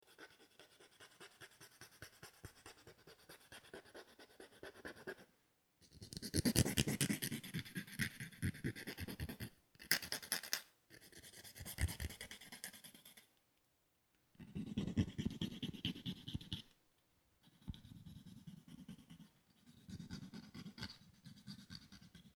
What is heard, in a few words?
nail nippers